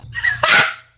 risada

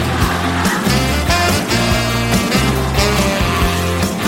toque sax

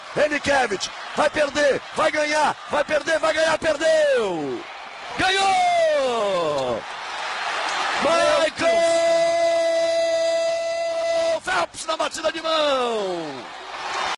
na batida de mao

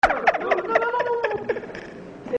risada; joao kleber

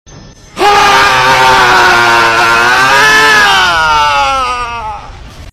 grito

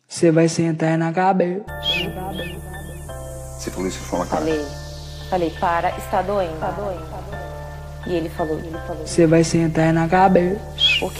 brega funk remix

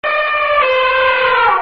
som de elefante

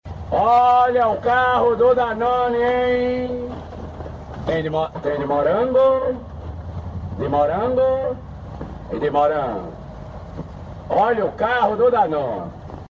carro do danone

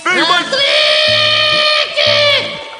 grito; bob esponja

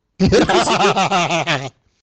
risada

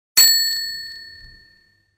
efeito sonoro campainha mesa

